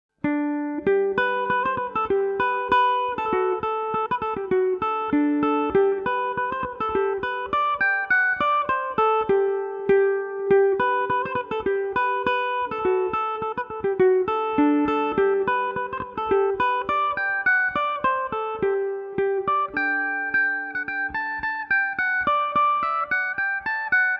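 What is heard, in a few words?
polka G major revelation, irish mandolin; knocknaboul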